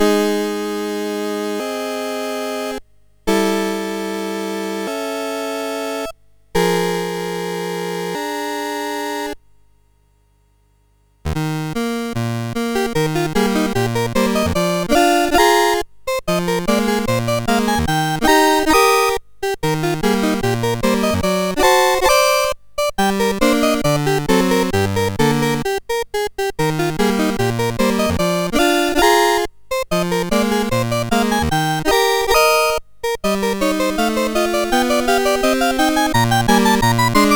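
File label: Pizzicati, MOS CSG8580R5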